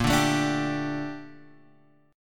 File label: A sharp Major 13th A sharp Guitar Drop D